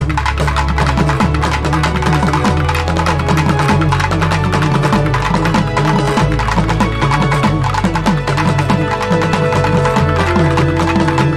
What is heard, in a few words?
Love BGM; Karnan